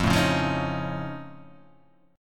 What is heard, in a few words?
F Augmented 7th F, chord